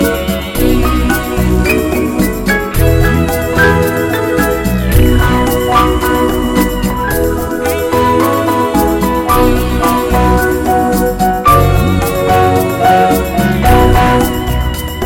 Beautiful Rumba

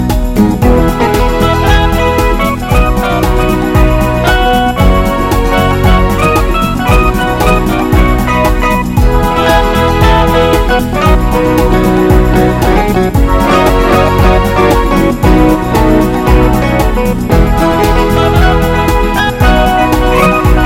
Wonderful Guitar Ballad

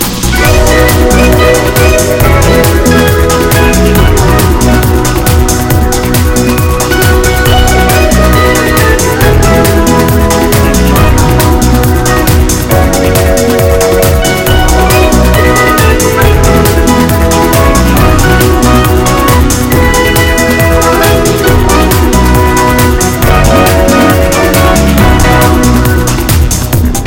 Quick Moving

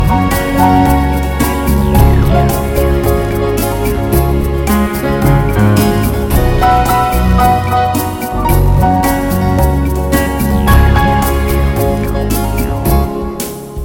Slow Beautiful Swing

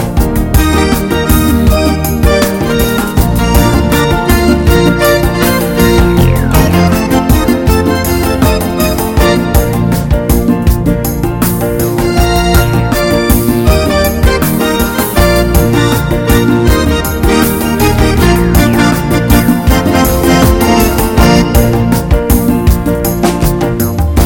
Fast and Happy

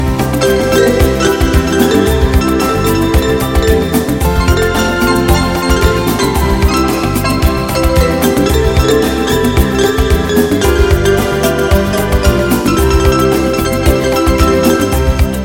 Pretty and Smooth Music

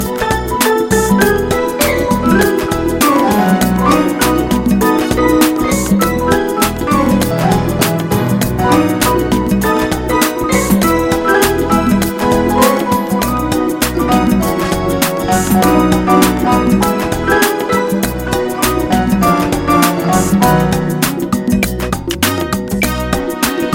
Beautiful Dance Rhythms

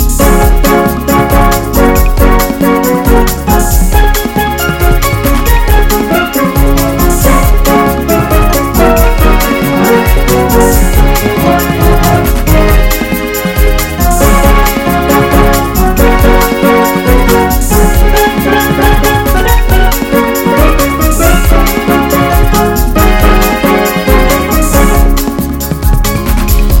A Fast Boogaloo

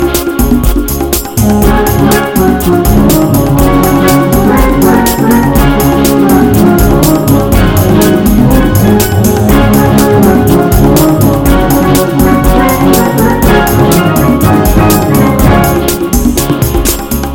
Hot Rock Blues

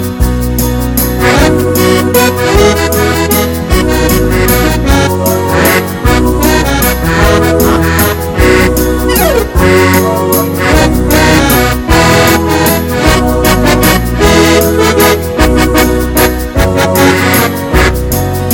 A Beautiful Waltz

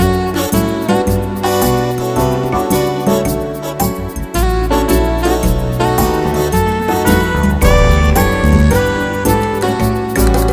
Great Happy Swing

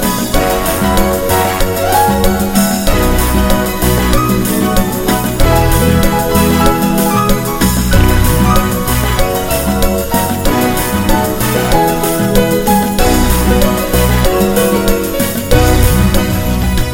A Happy Cumbia

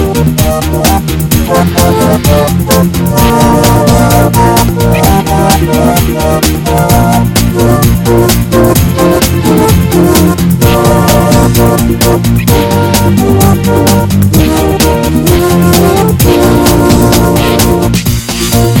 Long Ago Rock

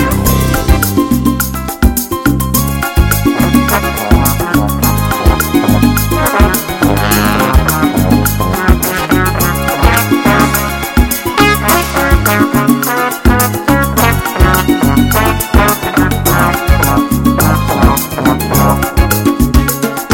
A Hot Salsa

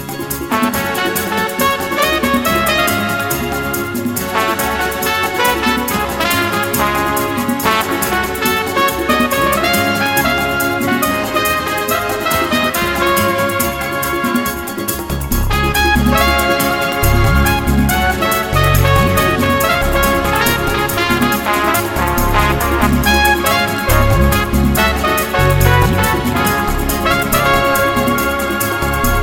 Fast and Hot

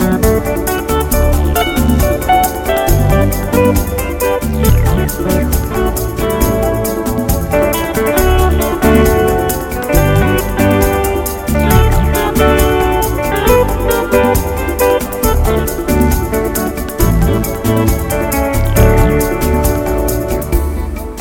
Wonderful Bossa

